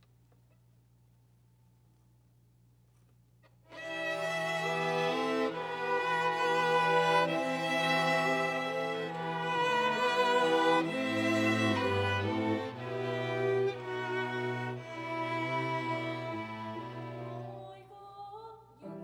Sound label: Overhead